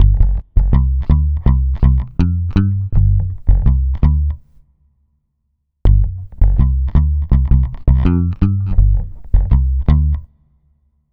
Dub Bass, Electric Bass

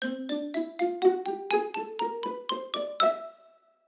orch sting; musicradar; cartoon caper

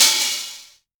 hi hat open, Korg Electribe ER-1; Kit 17

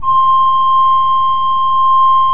KOPPELFLUTE C5, Cathedral Organ; Propeller Island

